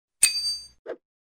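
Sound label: bomb and grenade sounds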